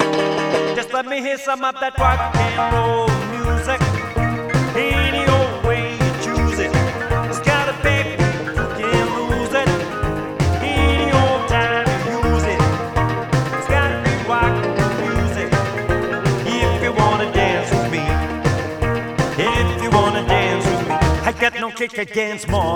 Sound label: rock n roll music